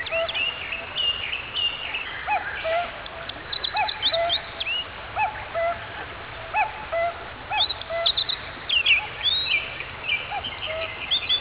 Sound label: bird